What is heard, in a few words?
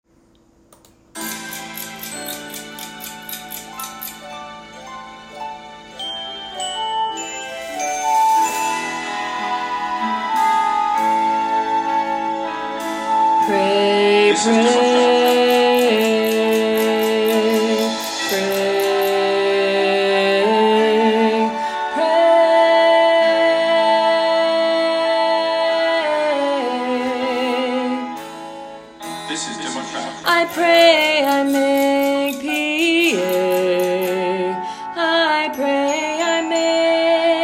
Tenor Opening “Pray”; Fame The Musical - Holiday Pack